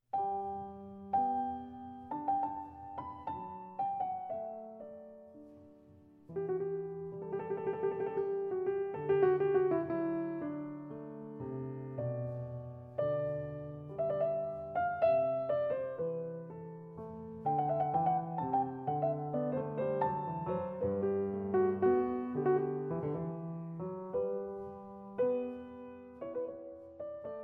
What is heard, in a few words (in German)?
Goldberg Variationen Aria Piano